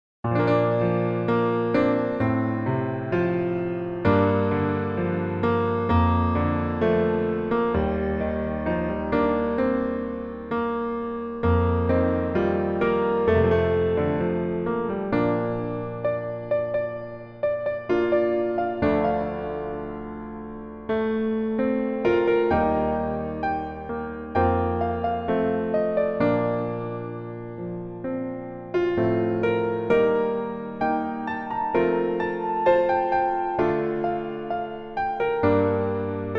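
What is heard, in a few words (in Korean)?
Piano version 멜로디MR -1키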